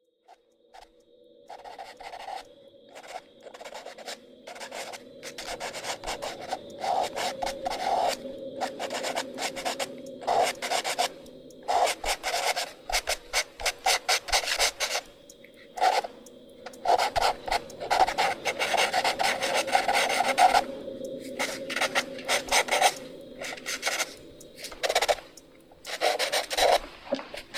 Scribbles

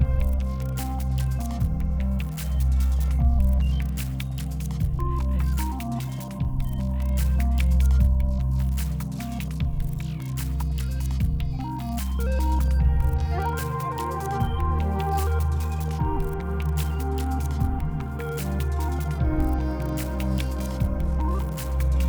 Puzzle 8CH 75BPM; Benefactor

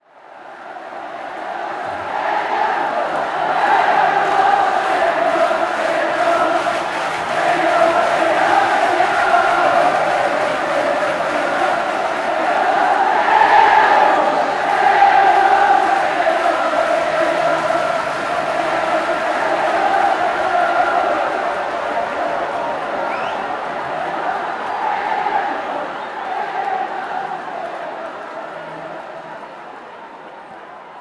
Pong Crowd; Hyperdrive Massacre

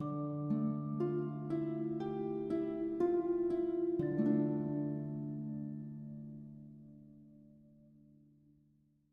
Stinger Event Tranquility

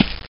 leaf

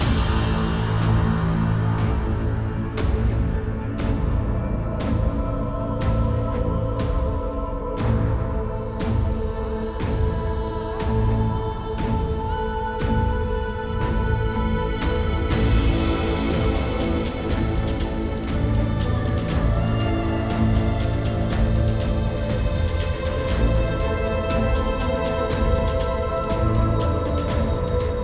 Fighting Phase; Nebulous Fleet Command

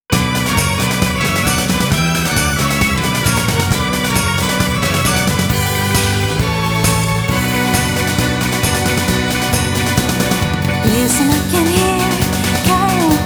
BALALAIKA, CARRIED WITH THE WIND; Drummania 5th Mix; Guitar Freaks 6th Mix

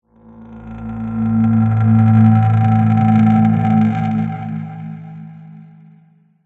Ambient; Alien Breed Trilogy; PS3; Xbox 360

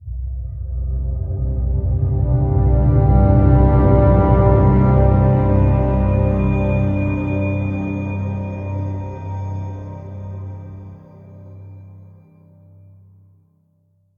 stinger

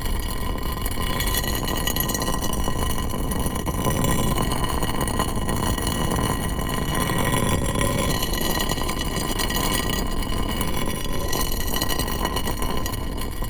glass slide loop

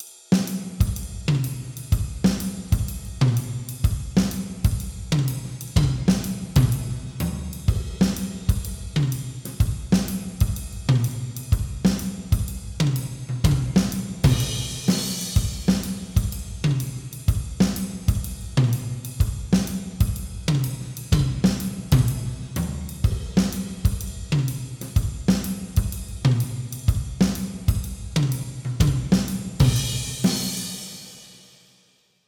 Intro music Layer 125bpm; Dude Stop

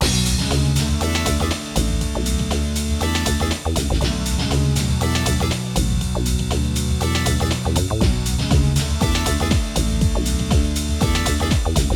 Birdie; Street Fighter Alpha; PS1

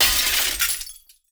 glass break; teardown ps5 windows xbox series xs gamerip 2022

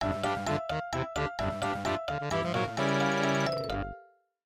MIDI Animation stings

